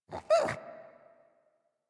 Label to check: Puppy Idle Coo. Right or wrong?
Right